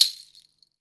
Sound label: Percussion; Vengeance Samples